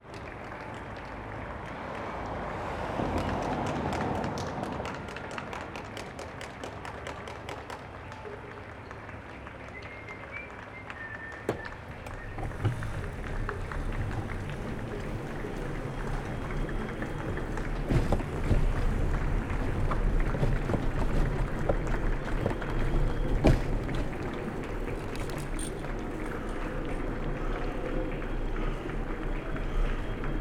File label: applause drive by